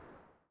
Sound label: stop, vehicles